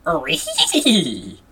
rhehehe

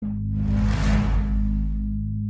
dramatic; rpdr

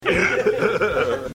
laughing

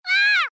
scream; kirby

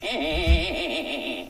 laughing; mrs brown

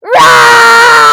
rahhhhhhhhhhh

